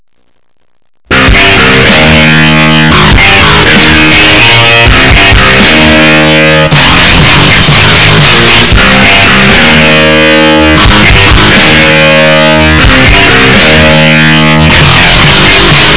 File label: weaponry